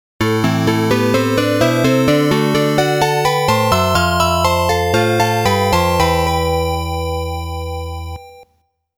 Harp; Dinosaur; complete FM oscillator version

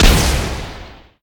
Boss Attack Hit; Pac-Man Championship Edition 2 Plus

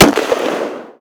SVT40

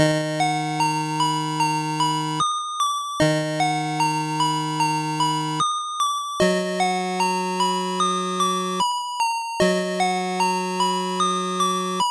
Lute; Spellcaster; Master System

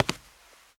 walk concrete

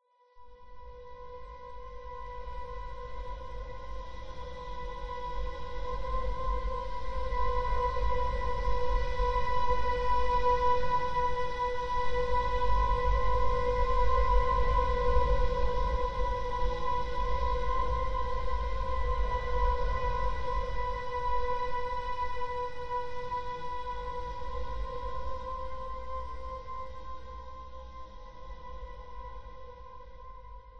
String; The Sinking City; Interiortwo

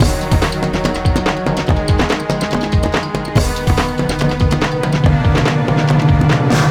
Battle Intro; Asterix Obelix XXL 2

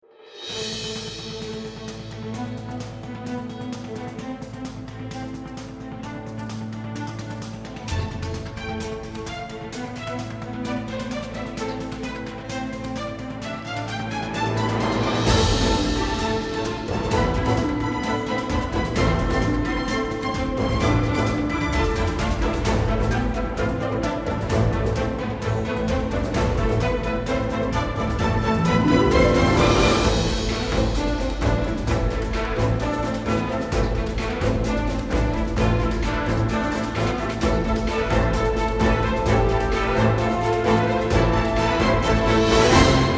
Field BGM; Xenoblade Chronicles 3

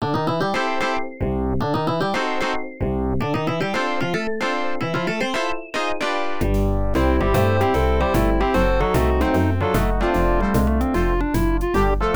Galactic Dancing (Big Band Jazz); galaga 88 namco system 1 arcade

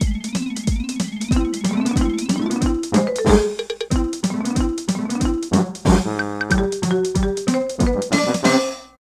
3rd Place Circuit; lego racers

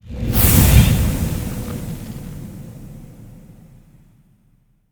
sound Save Point Blue Fire; freedom fall

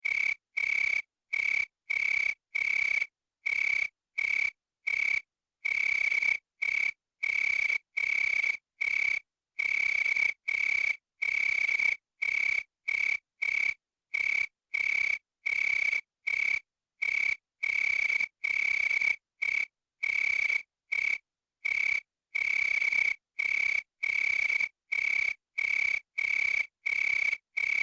Crickets; little red riding hood s zombie bbq nintendo ds

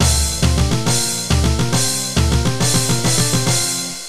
Power Up, All Stage Clear; The Age of Heroes Silkroad 2; arcade

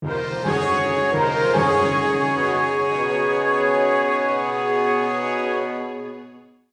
Lose Battle; heroes of might and magic online 2010 pc

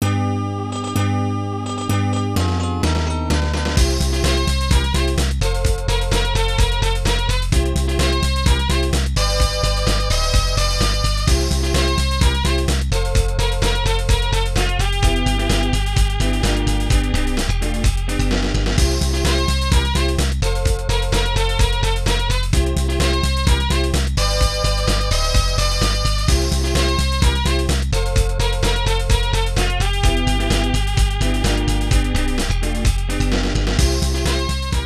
Lose

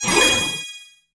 Archer Group Hide; Inotia 3

